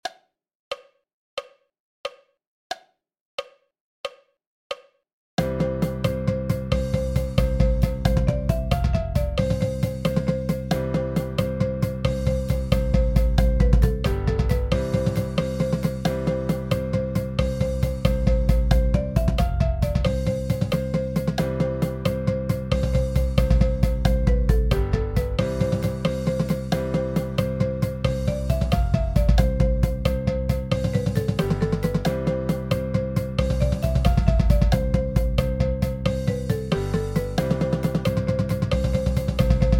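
90 BPM Cheesy Rolls with countoff, Marching Percussion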